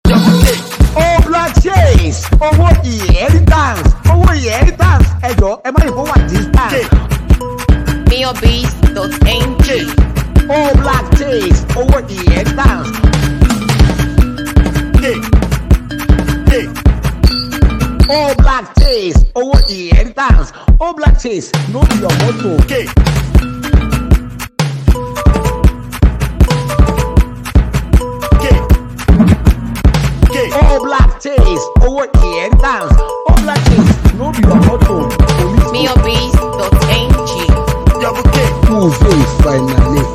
Chase Beat

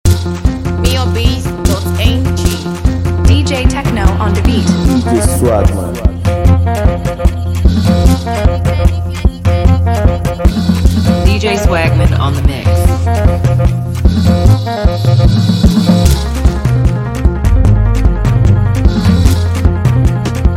Beat

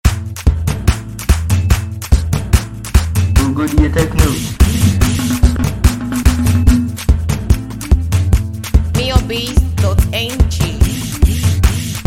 Beat

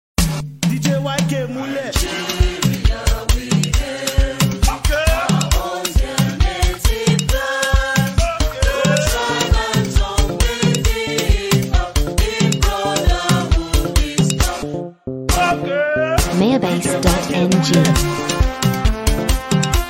New Nigeria National Anthem

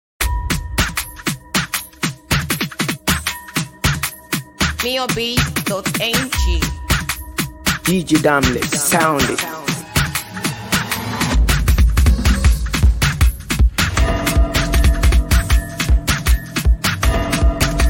Mara Beat

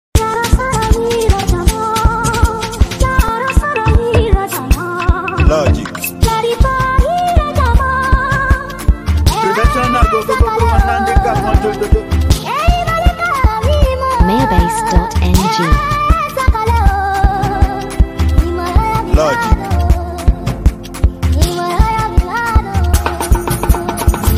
Beat

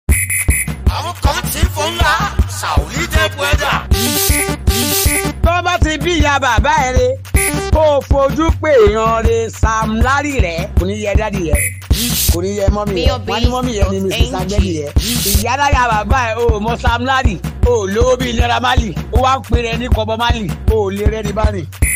Beat, street

